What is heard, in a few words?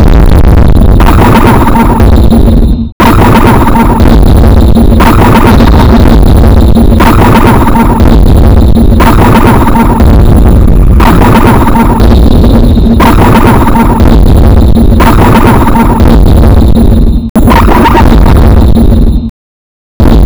sine wave